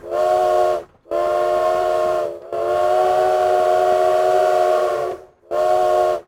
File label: steam train whistle